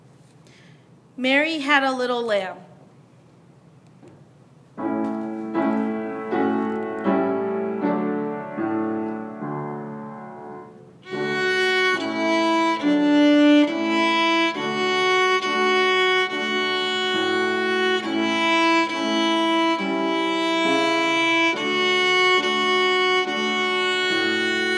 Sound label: Mary Had a Little Lamb with Piano